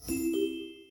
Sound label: match join; seele 【azer】